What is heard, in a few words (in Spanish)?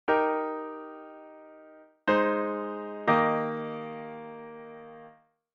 Cadencia Plagal Iv a I, Eb; auditivo de cadencias autenticas plagales rotas y semicadencias; Identifica la cadencia